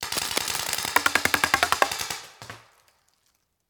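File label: Paintball Gun Impact; Sound Ideas; Guns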